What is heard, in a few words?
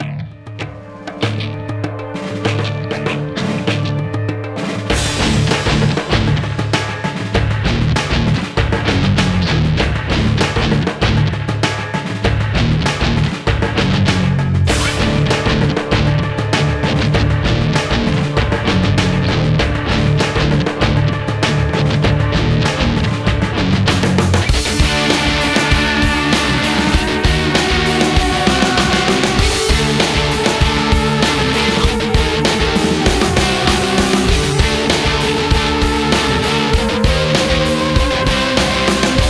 Combat; Mystic Caves; prince of persia warrior within 2023